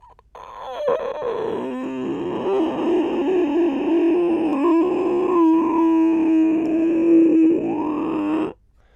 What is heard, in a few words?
tonal